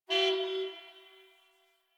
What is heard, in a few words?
Distant Car Horn